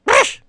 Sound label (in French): echec; MOBICLIC41